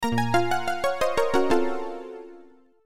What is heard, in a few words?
battery empty